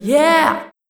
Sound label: Vocal De Du Dip Du Dup